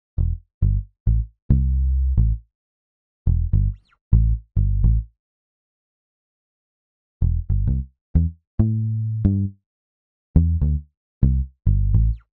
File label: Island Reggae Bass